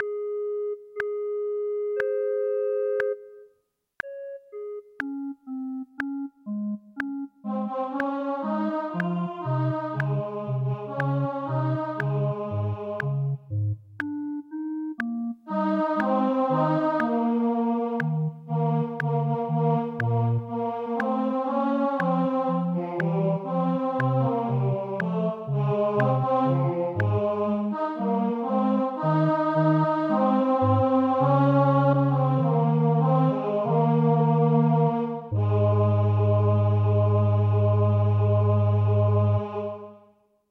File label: O clap your hands; Gibbons